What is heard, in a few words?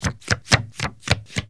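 casino ambience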